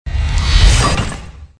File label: craft Sound; cstrike